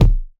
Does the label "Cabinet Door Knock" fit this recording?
No